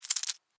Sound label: Cockroach Scurry, Cockroach, Scurry, Short, Movement, Insect, Bug; Cockroaches; Animals:Insects; Blastwave